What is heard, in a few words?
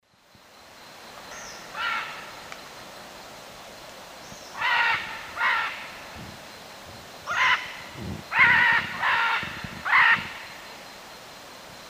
Ara chloropterus, flight calls; S. America; Eastern Lowlands